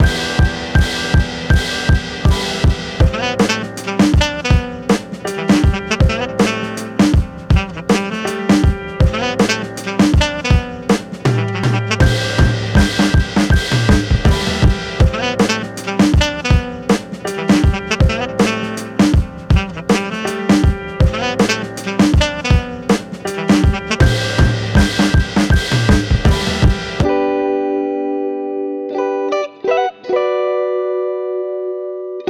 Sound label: old school rap beat